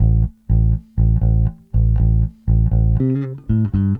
Bass, 70s Analog Funk

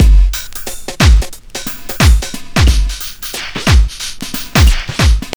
TRIP 90, trip loop

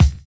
KICKING; Univers Sons - Kitch 70s

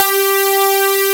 Jup 6 Buzz Pad G3; Proteus 2000; Digital Sound Factory Proteus 2000

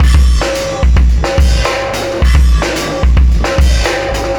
System Overload Beat, Processed Drumkits

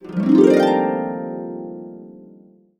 UP140, Complete Harps; USB Soundscan vol.62; AKAI

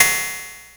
blips, opl3

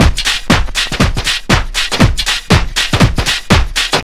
house loop

